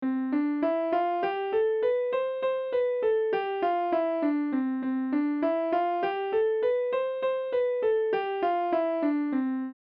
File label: Major Scale Sparkle